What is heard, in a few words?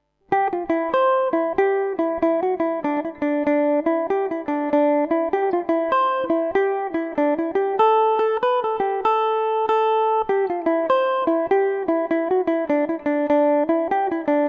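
jig A minor revelation, Irish mandolin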